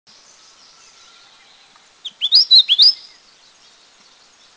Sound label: Whistlers Warbler; Dirang; India